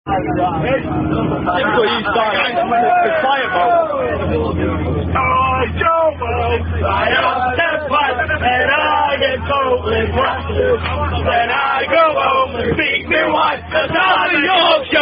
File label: Rugby Songs; Yorkshire B----D; Various Rugby Songs